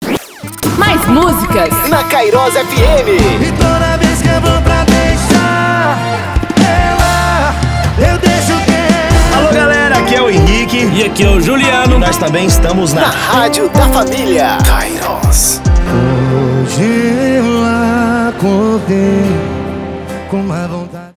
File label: intro music power